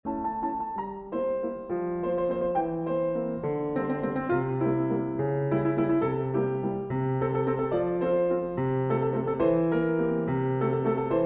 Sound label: Waltz No. 2